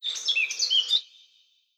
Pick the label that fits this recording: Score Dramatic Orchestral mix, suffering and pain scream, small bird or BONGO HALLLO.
small bird